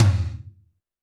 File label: TD-20 Tom A, Roland TD-20 Toms